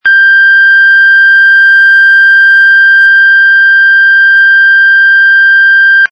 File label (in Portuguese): CONTINUO (1300Hz)